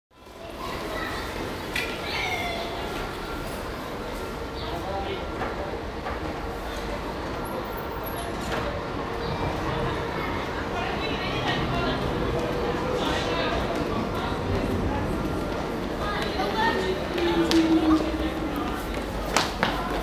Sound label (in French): espace publics tramway contrebas; fnac